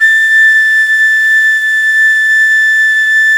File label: NYE FLUTE; Nye Flutes